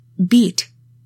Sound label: beat